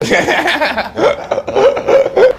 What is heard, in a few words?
laugh